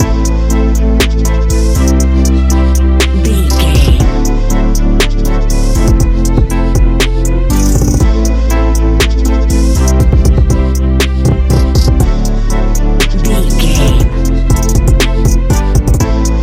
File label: tranquil beat tapestry, A relaxed and chilling piece of music